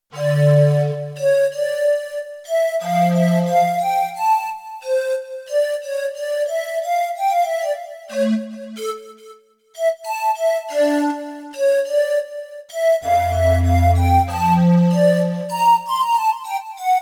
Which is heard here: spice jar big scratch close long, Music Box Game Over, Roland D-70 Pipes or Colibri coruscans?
Roland D-70 Pipes